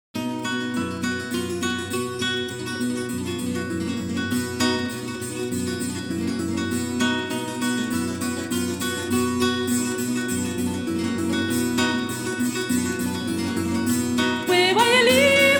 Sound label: Tarantella